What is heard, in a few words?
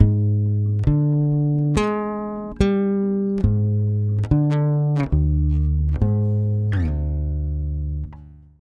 140 DDDIM, Brazil Bossa; USB Soundscan; AKAI